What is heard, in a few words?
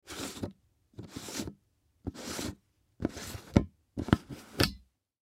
Container Unscrew Lid; Foley; Blastwave